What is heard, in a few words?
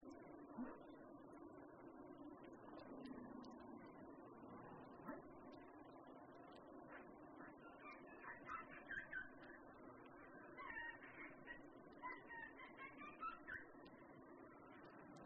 humpback whale song